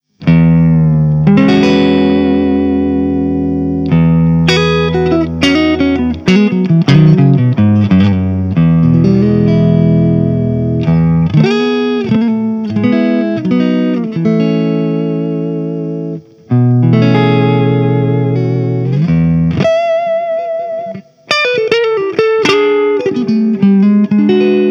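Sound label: clean Strat Torpedo; Bluetone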